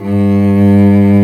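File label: strings solo, large ensemble; String Master Series; Combos 1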